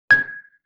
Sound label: Xylophone Single Note G#; Vintage Cartoon; Musical Elements